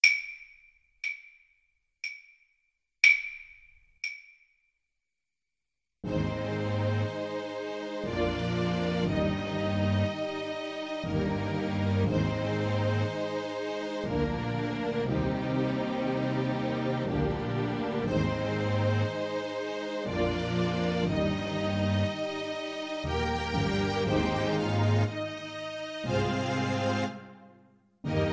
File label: holst jupiter, trumpet